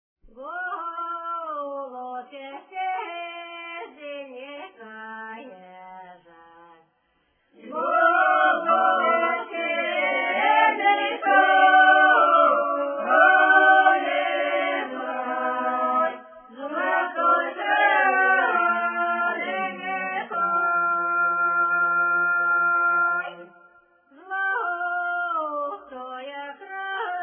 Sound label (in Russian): хороводная, д.Кочемары Касимовского р-на; запевает; Голубь сизенькой, протяжная